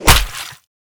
sharp heavy, impact; tfa starwars melee weapons pack; nmrihimpact

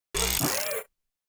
robot walk; tridroidznnpc

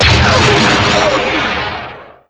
fire cannon; gmod; sw vehicle lfs at te; kingpommes; starwars

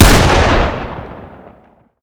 fire; gmod; arccw uc commons attachments; ub12ga

